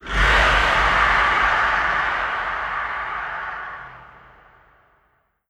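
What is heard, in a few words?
rear right, antlion distant scream; creatures; Model Pack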